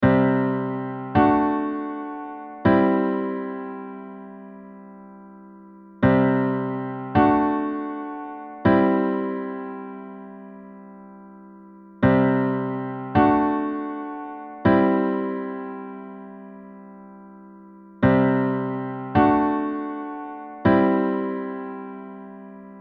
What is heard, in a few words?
Intro Backing